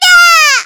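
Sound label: pain critical death; voice packs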